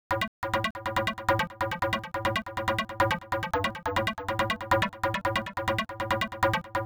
303 line

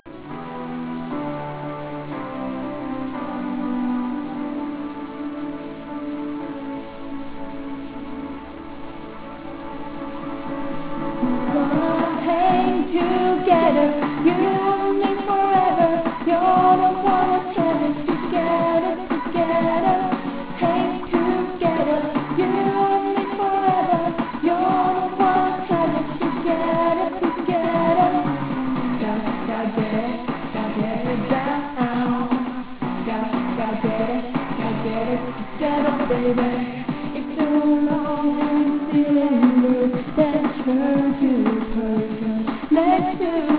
karaoke

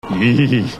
tick eeehee

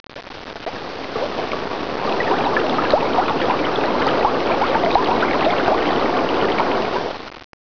Water Rushing

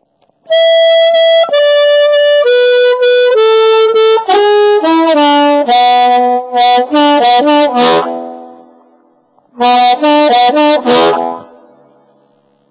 harmonica

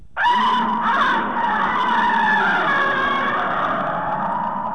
roar; Gamera's roar 1; Gamera heisei

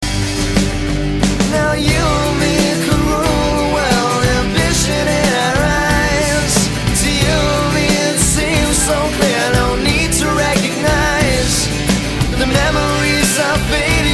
fluorescent lights

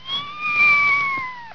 mew, animals

